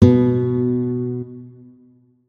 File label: notes, guitar; Hideout Furniture